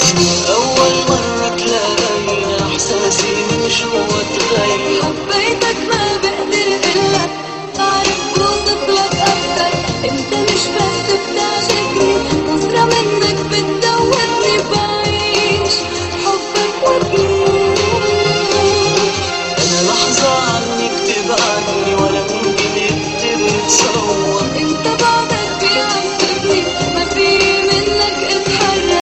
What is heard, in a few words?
arabic love